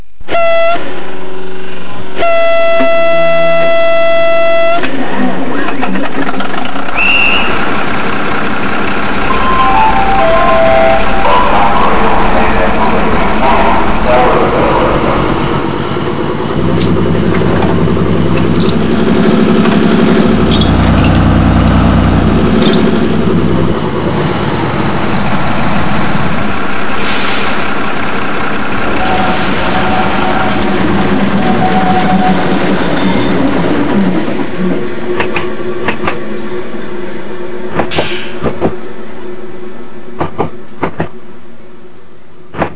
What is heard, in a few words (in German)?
Hoerprobe MK45